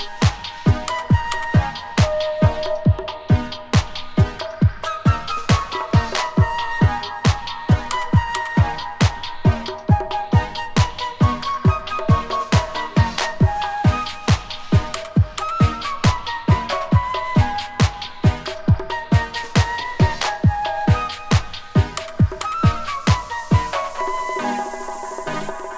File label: flute